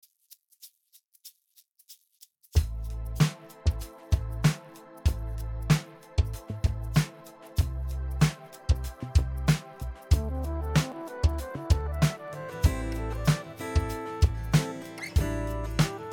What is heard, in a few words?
Guitar Song